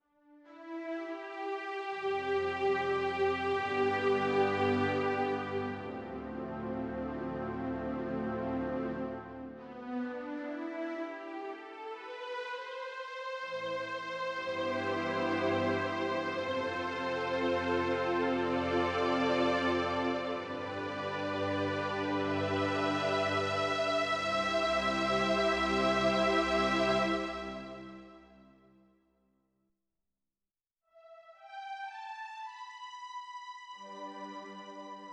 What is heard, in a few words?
CN34 Strings